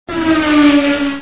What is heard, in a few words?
Drive By, vehicles